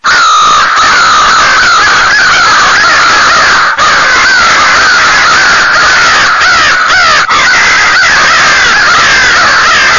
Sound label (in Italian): CORNACCHIA FALCO ATTACCO, birdsound